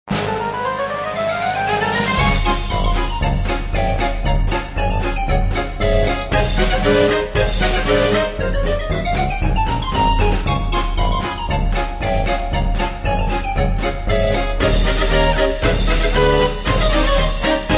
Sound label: Circus Clowns Loop